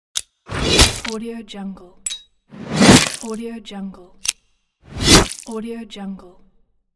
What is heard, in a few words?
trap